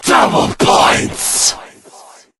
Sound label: powerup double points